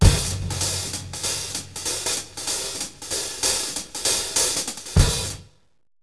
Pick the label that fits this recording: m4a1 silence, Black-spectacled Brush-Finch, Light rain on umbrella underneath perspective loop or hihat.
hihat